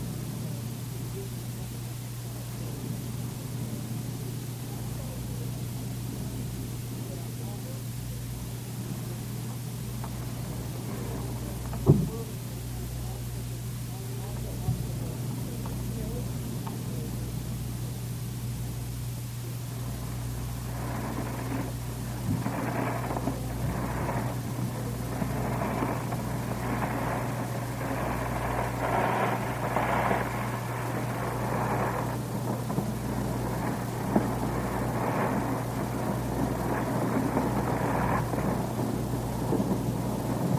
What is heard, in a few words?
furniture moving sounds waterfall, Nest